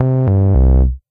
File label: fail